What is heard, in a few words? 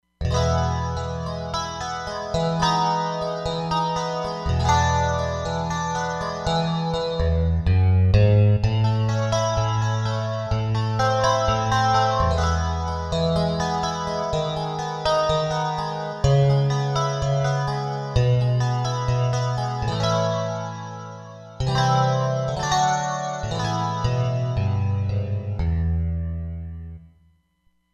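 Strat Guitar, Roland Fantom XR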